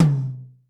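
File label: tom, acoustic set; drum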